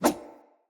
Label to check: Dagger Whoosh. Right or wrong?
Right